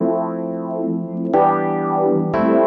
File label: PIANO 90 A; old rec